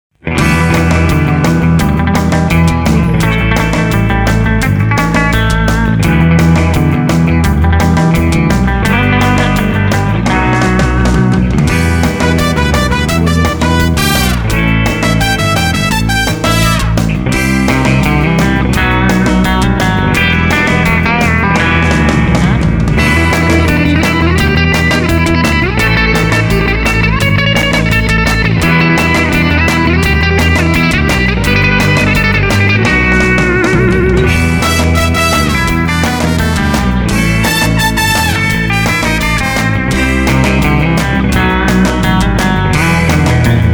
surfin

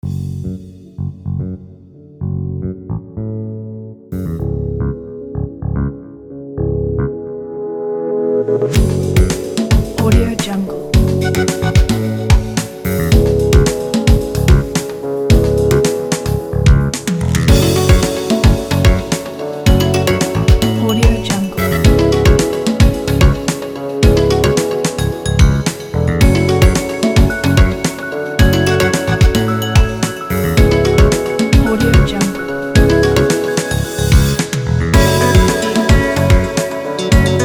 summer jazz; alivestone

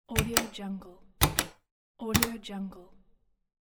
big plastic switches

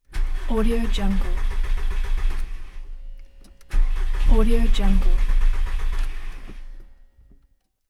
cant start car engine

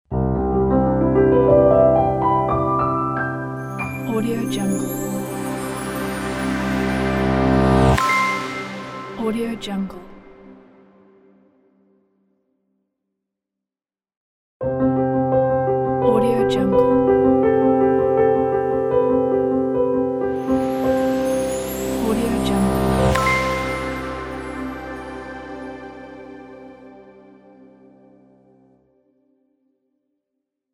piano logo; piano logo pack 2

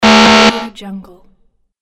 bad answer; bekibeats

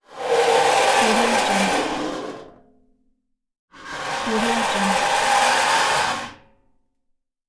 metal plate slide

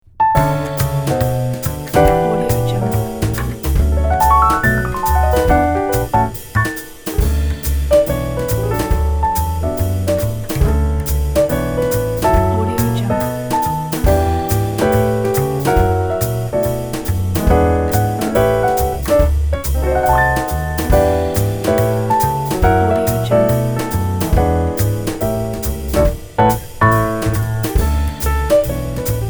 jazz trio

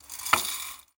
observation binoculars tilt; blast drive 30; prosoundfx

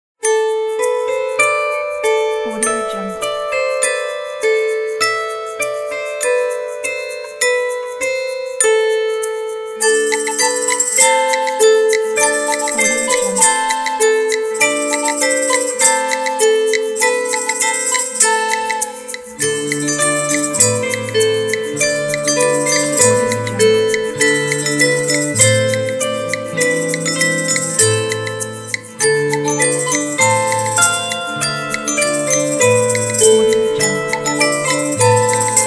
fairy sadness

